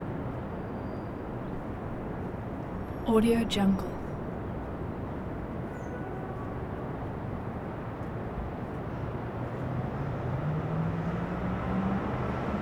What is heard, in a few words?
urban traffic